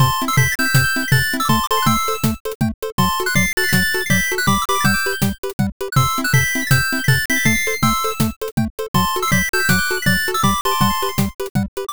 bgm bright

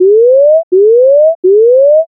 scan

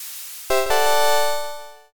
challenger

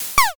sneeze

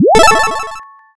boot

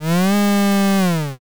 cow

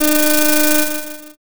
slot spin

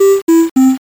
picosad

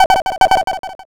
frog

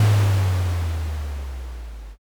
distant thunder

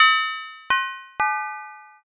metal drop

